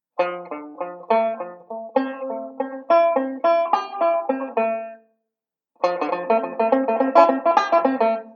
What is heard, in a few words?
ii V Pattern, banjo